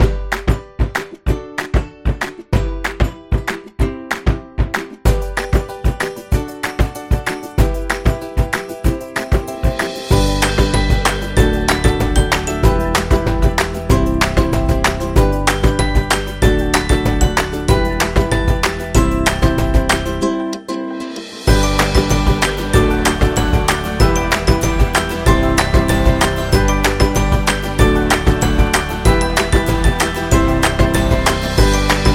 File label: Happy Background; happy music vol 9